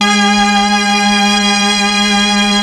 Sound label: STR, STRING